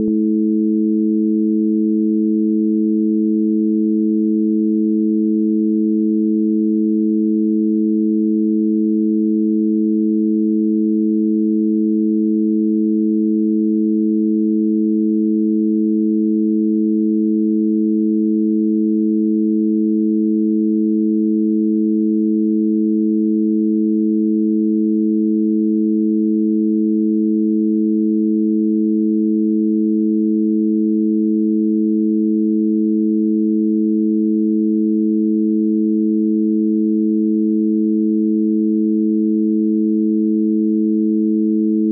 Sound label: ambient 432hz